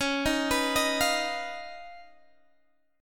D sharp 7th Suspended 2nd Sharp 5th

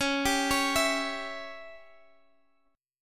C sharp Diminished C sharp, Guitar Standard; arpeggiated; chord; x,x,11,12,14,12; JGuitar

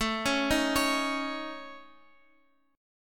A Major Flat 5th, Guitar, Open F; AMb5; arpeggiated; JGuitar

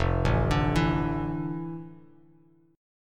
F Major 13th Bass Standard; arpeggiated; JGuitar

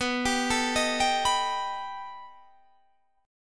B Augmented 7th B down; B+7; arpeggiated

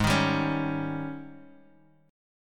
G sharp Major 7th Suspended 4th, Guitar, Open D; 6,6,5,7,x,x

